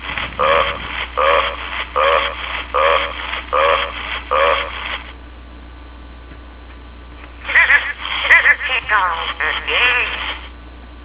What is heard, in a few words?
burps